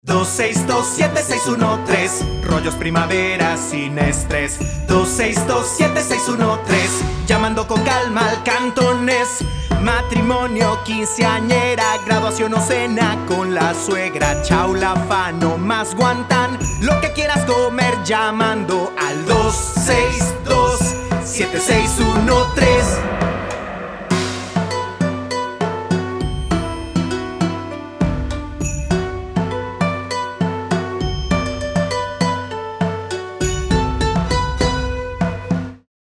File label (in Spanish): Jingle Cantones